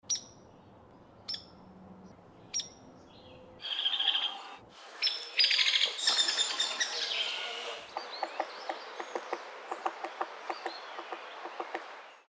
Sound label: hairy woodpecker; calls; Audubon